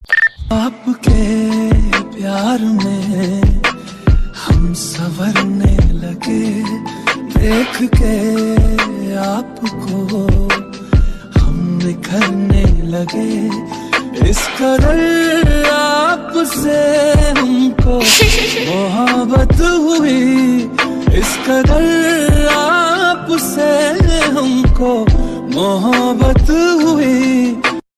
male hindi ringtone

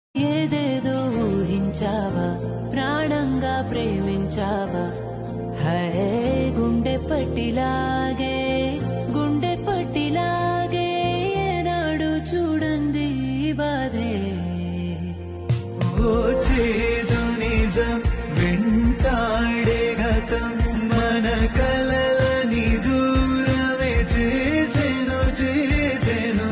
telugu ringtone

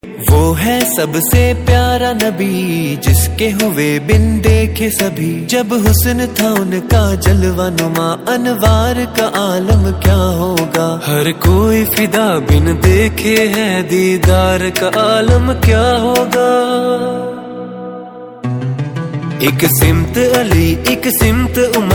islamic ringtone

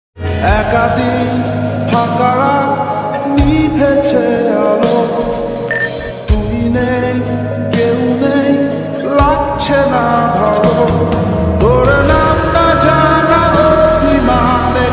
lofi bengali ringtone

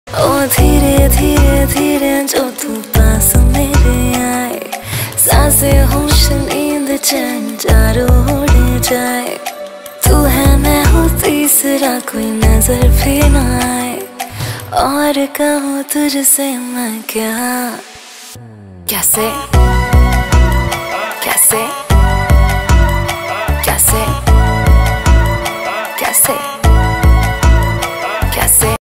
hindi rap ringtone

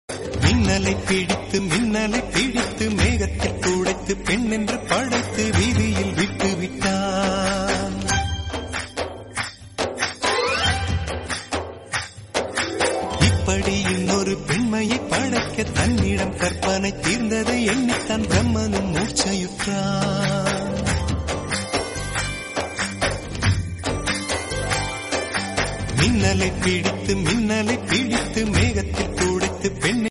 tamil ringtone

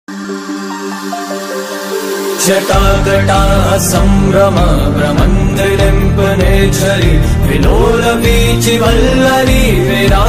devotional ringtone; shiv

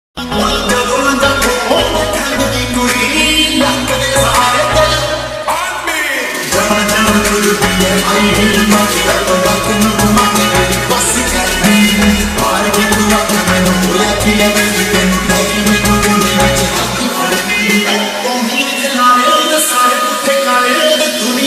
slow reverb rap ringtone

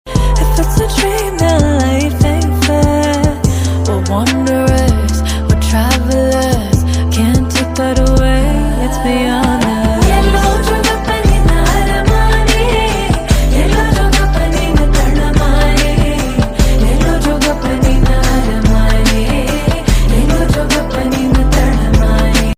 tamil ringtone

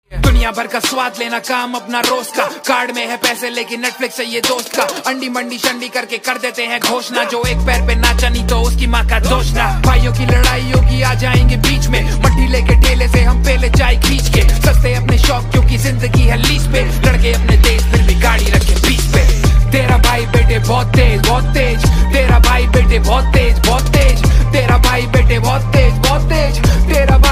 hindi rap ringtone

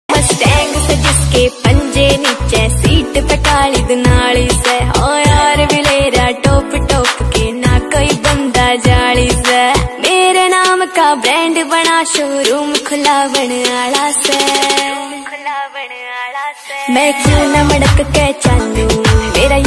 haryanvi ringtone

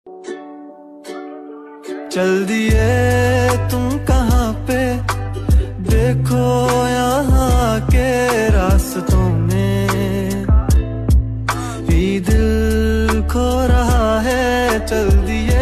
OST ringtone

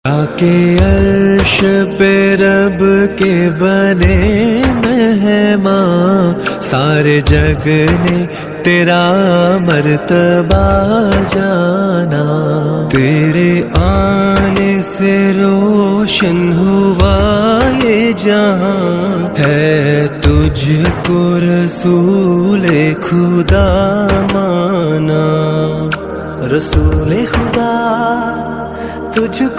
islamic ringtone

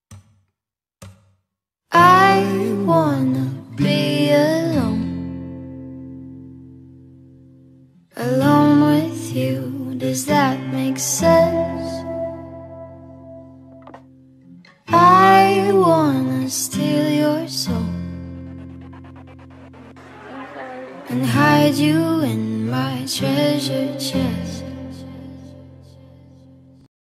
english ringtone

